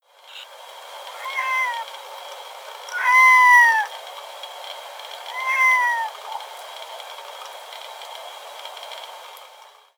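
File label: loon; アビ目; 鳴き交わし; 愛媛県四国中央; 日野賢司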